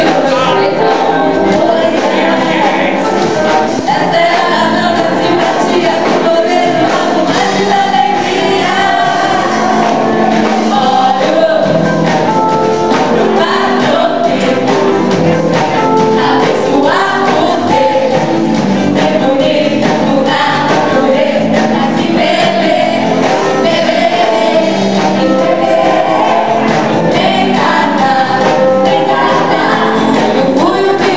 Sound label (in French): carnaval, bresil